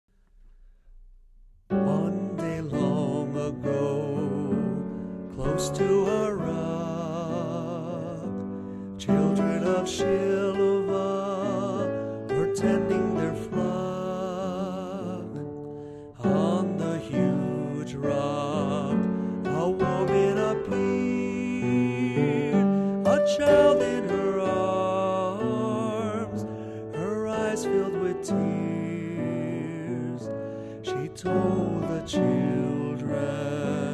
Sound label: hymn